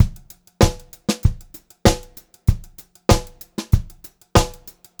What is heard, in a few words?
VARI, Pop-Rock Drumloops; USB Soundscan vol.37; AKAI